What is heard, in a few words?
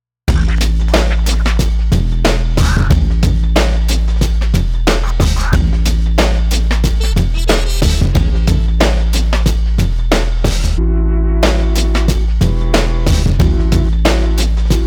Accord, Percussive, Edgy, Hype